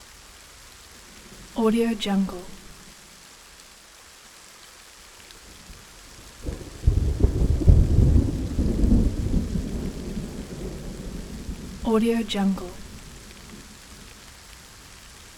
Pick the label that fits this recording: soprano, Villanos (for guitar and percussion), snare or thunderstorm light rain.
thunderstorm light rain